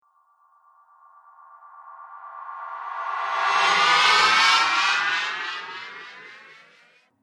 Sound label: Ufo Riser Zoom, Spacecraft; Spacecraft Sound Effects